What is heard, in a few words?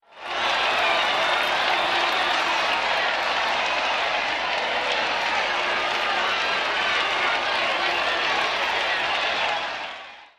cheer; Cybuilder